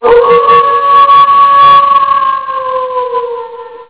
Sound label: Crying Wolf; Innostream INNO 55